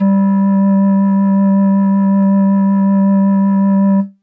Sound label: Pan Flute DX100 G2